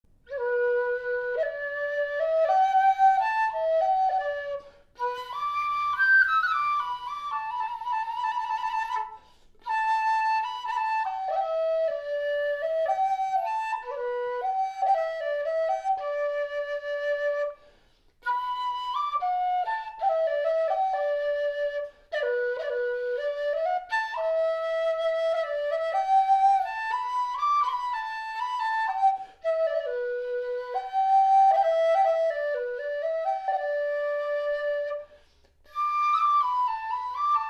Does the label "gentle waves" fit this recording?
No